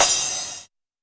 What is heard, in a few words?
TR909 crash, Roland SH-32; Drumset 03